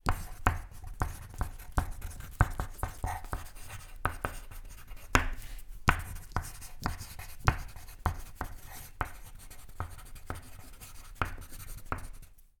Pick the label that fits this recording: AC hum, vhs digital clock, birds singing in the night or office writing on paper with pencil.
office writing on paper with pencil